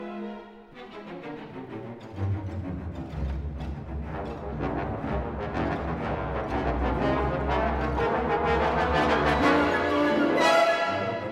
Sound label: orchestra piece music for orches